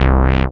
SYN SH, Roland; Sound & Vision - Gigapack I CD 2

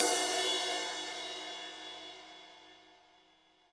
HiHat & Crash, Roland TD-20; HiHats

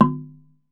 TUNG LO, African Perc; E-MU Producer Series Vol. 7 – Old World Instruments

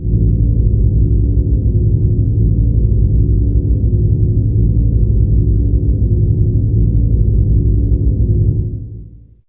Dawn, Fairlight CMI IIe; Effects

